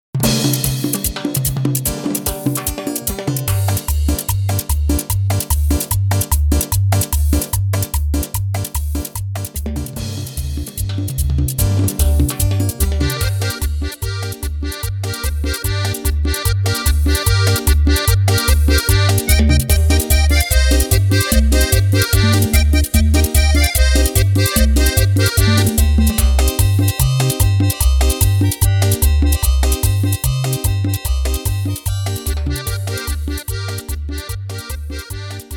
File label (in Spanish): Instrumental Acordeon 148 BPM